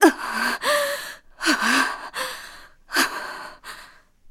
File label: Breathe; Hilda breathing heavily (approaching Zelda after Yuga Ganon is defeated)